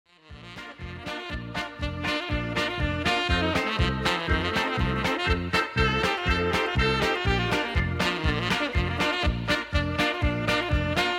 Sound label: clarinet polka